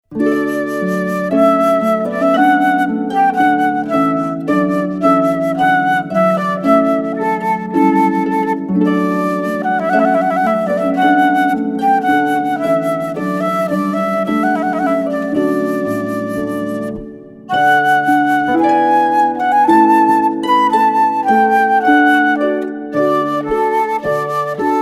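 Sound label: Trumpet with Harp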